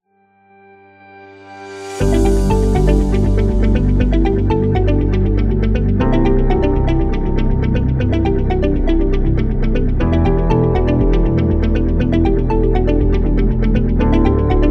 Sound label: Health Music, Fashion corporate background music that blends elegance, rhythm; fashion beat